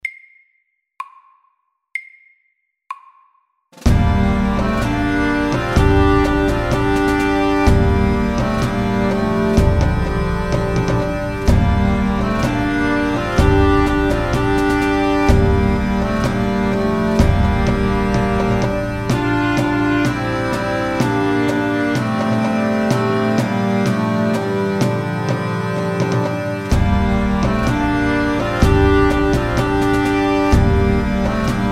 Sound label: Pipes and drums; FORT